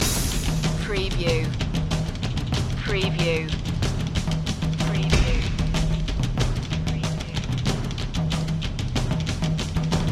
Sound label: onslaught percussion loop